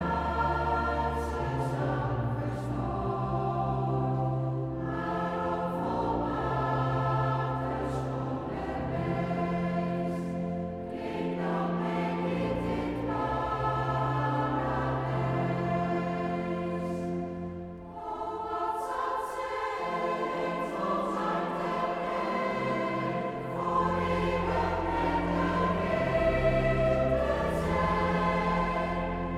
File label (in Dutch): Koor dames